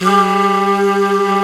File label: flute vox, singin' flute